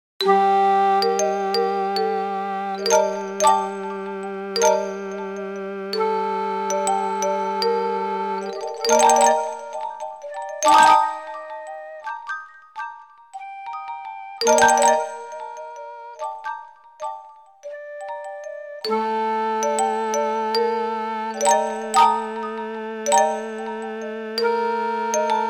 shakuhachi + marimba with rests

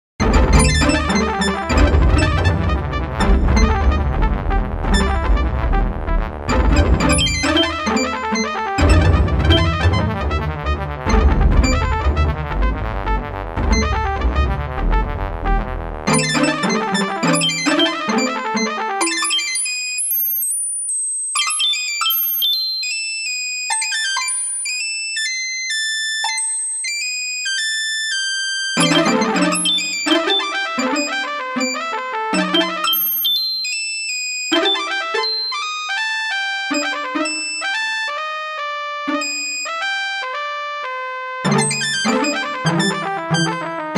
jazz scale; fractal music; Sloth canons

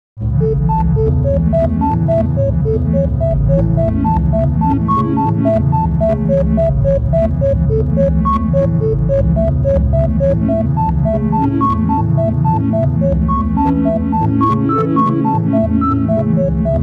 syncopated

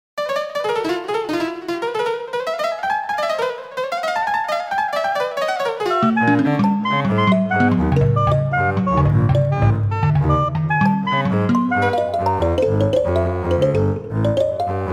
jazzy fibonacci tonescape

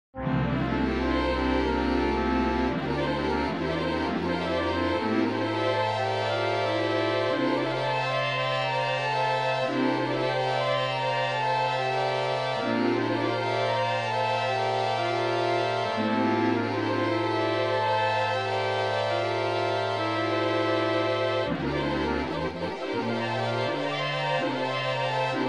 arabic 17 tone maqam Iraq; fractal music; Sloth canons

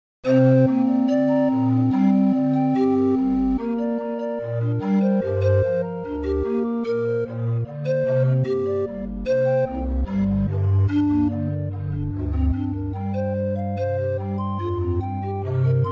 forest pan flutes

